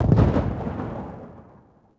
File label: distant explo